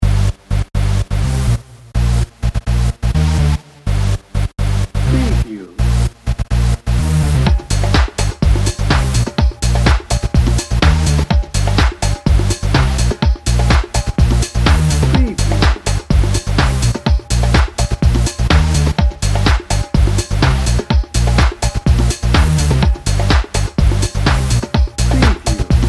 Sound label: bollywood beat blaster dnb